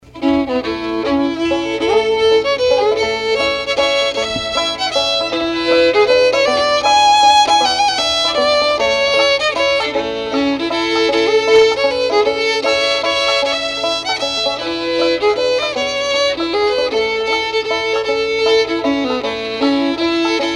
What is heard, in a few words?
Canary Waltz